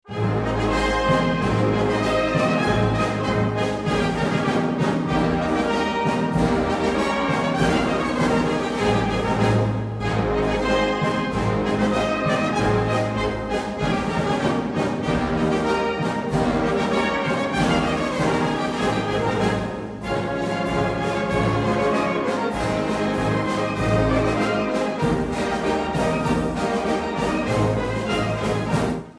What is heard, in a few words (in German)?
Bayerischer Defiliermarsch; Scherzer